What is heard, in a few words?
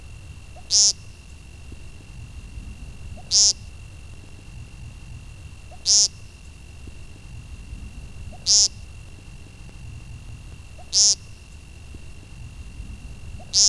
Woodcock peent